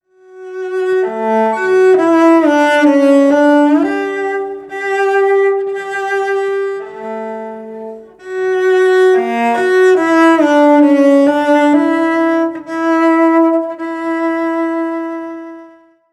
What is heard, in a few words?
Salut D'Amour, cellist; wedding